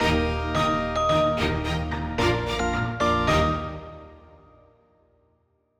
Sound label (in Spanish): sin drums